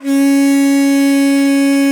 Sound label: BON DI, Winds, Old World Instruments